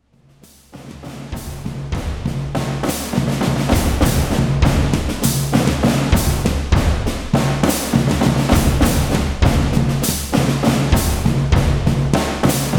Marching Drum; Gunbrella

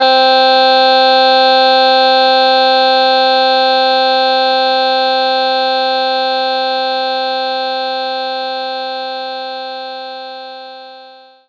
harmonica C4

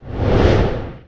Sfx Aura; friday the 13th killer puzzle

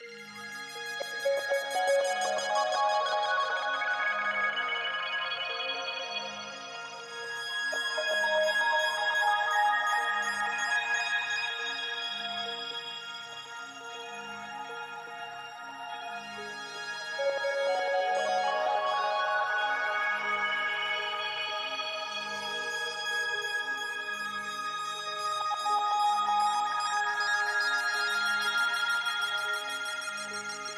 ambient mystery

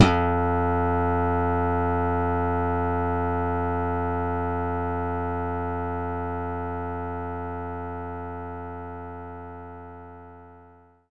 Slap Bass F3; knights try

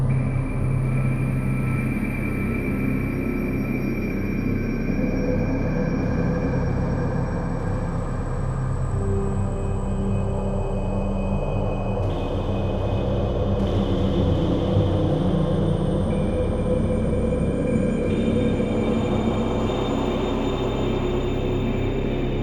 hollow loop roomtone; heroes of hammerwatch

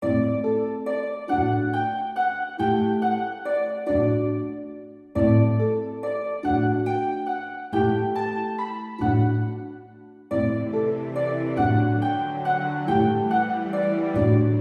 character story positive sadness; bgm

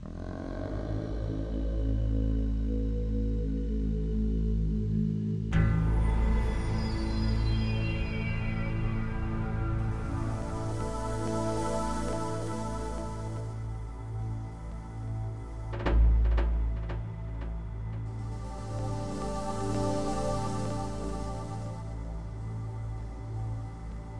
The Walking (Loop); beta unreal

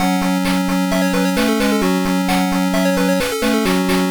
Unused Jingle; Kamen Rider 555; Pico

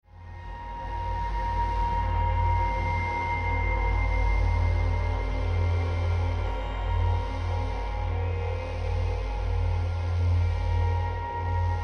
Climax Stinger; State of Decay

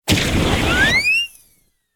Item Big Gum Scream; pac man kart rally android

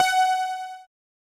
End Countdown; Zenkoku Juudan Ultra Shinri Game; SNES

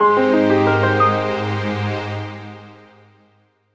(Jingle) Fanfare; Face Training; DS DSi OST

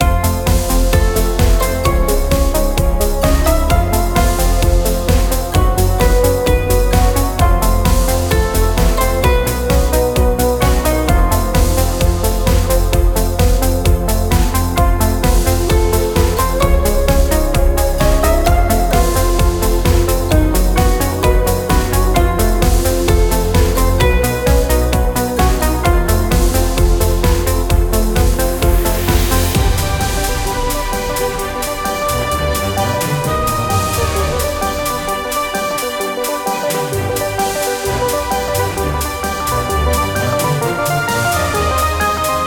Loop Synthwave; Hubworld DLC2Room

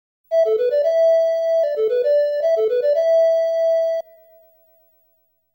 Ocarina; Daikaijuu Monogatari 2; The Magical Land Shelldorado

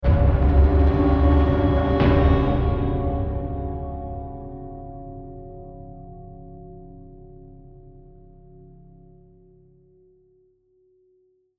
Tension Combat End; The Last of Us

